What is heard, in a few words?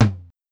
Tom Lo, Sequential DrumTraks Tom